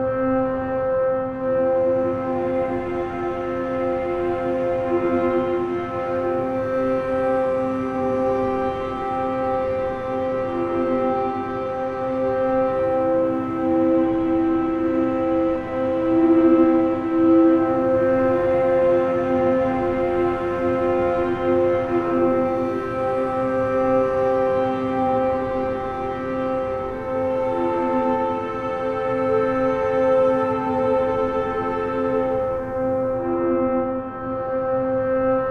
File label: String loop